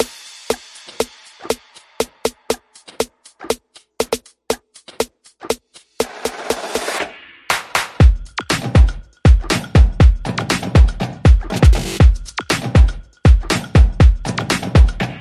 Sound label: Drums Only